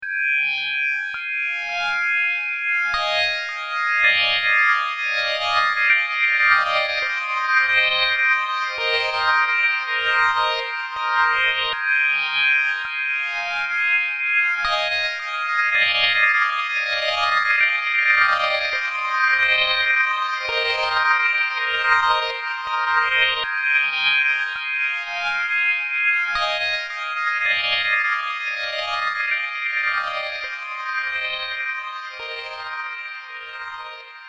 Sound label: automated auto pan